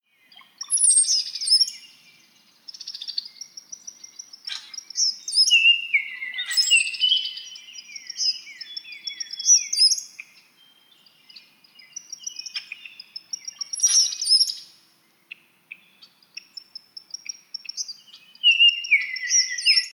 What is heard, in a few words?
Songbirds Farm